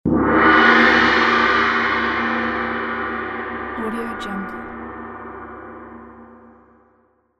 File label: gong